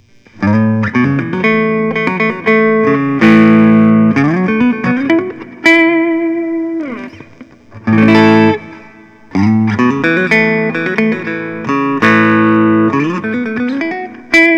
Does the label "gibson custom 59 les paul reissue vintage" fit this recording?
Yes